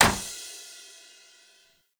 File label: Stadium Cymbal Destroy; Warframe; Ambience; Nineteen Ninety Nine